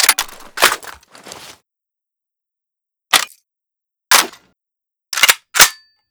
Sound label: kord reload; emplacement